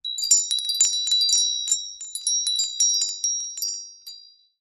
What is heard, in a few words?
household wind chime metal small short ring